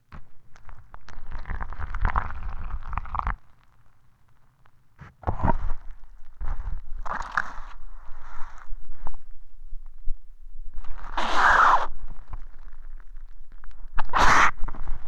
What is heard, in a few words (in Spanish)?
toma mate; Oído Absoluto